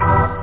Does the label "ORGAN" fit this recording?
Yes